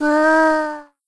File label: sniper scope